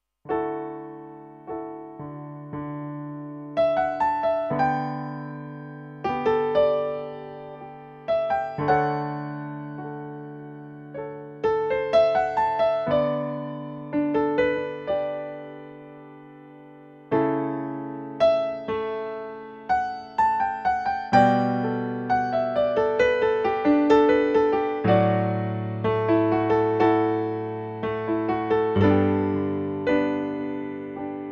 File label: Piano